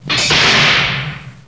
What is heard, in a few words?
door on death star